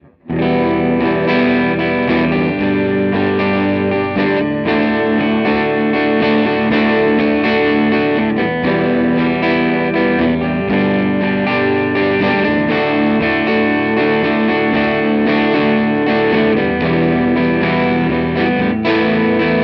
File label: Fender Twin Reverb breakup; rebelliant neck